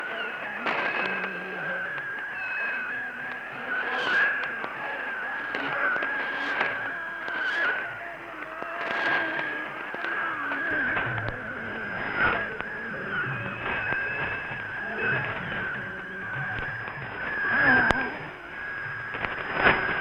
Radio All India 7250khz AM

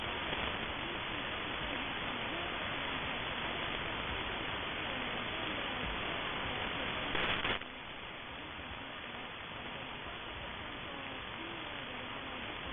999khz; RAI 1

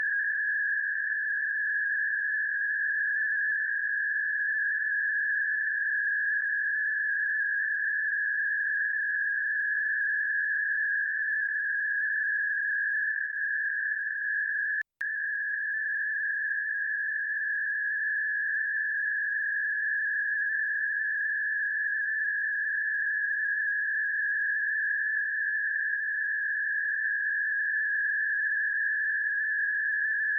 18.3khz, CW, Marine Transmitter, Frankreich; Sekunde 0 - 15: HF+ Discovery; Sekunde 15 - 30: Winradio G33DDC; HWU Marine Transmitter; Airspy HF Plus Discovery

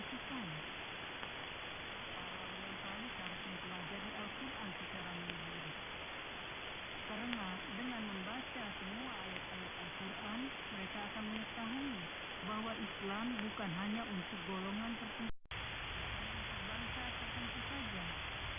21670khz, AMS; Saudi Arabien

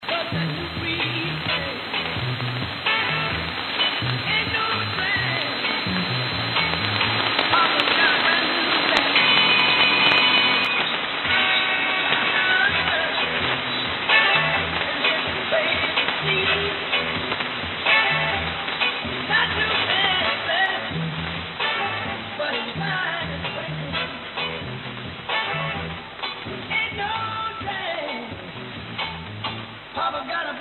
Pirat 1655khz

